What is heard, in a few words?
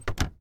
washing machine close